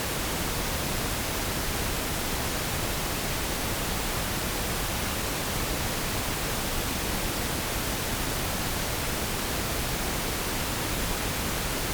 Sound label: RIGHT Pink Noise 20Hz-20kHz -20dBFS 48KHz